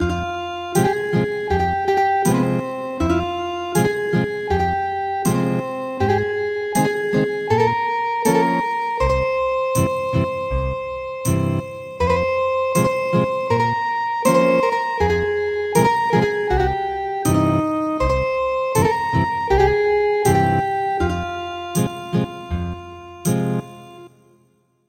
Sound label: Ethnic Guitar; Armenian lullaby